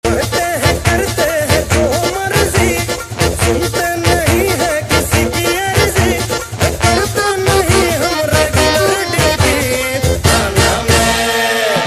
hindi ringtone

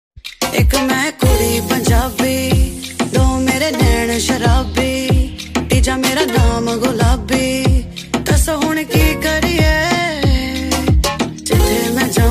Punjabi ringtone

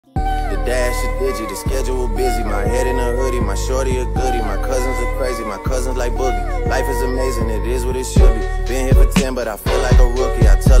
Remix hindi rap ringtone

